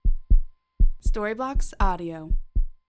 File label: heartbeat fast